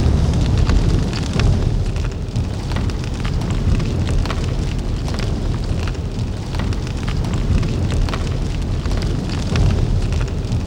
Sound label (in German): FEUER FX; Best Service; Advanced Mega Effects